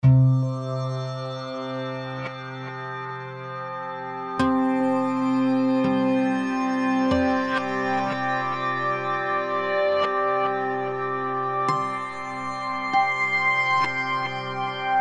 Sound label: Soothing Harmonics